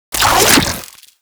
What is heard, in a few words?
Infested Rifle Charged Grapple; Warframe; Quanta